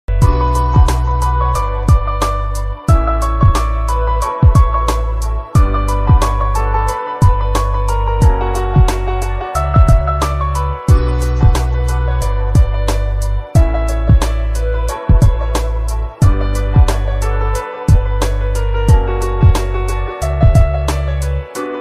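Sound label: song instrumental ringtone, punjabi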